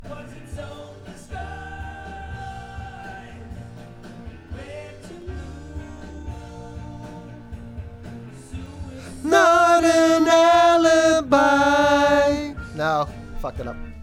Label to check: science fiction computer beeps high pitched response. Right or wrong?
Wrong